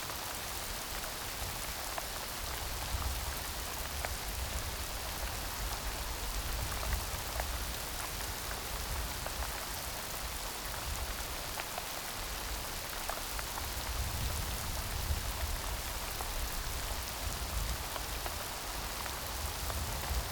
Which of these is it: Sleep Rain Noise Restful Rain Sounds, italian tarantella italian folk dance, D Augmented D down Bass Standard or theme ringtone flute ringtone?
Sleep Rain Noise Restful Rain Sounds